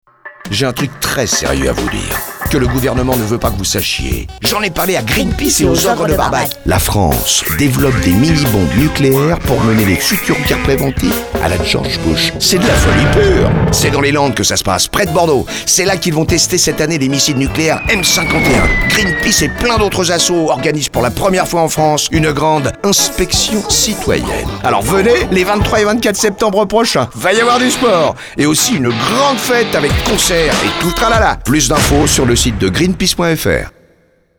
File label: jingle; Bruce Willis les Landes